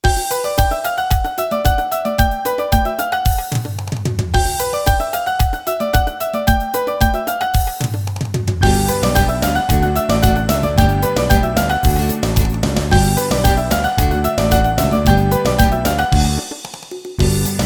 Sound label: SINGBACK, BULGARIAN